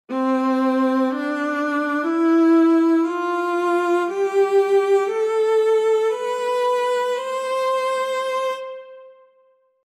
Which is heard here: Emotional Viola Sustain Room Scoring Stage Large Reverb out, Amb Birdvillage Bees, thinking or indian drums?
Emotional Viola Sustain Room Scoring Stage Large Reverb out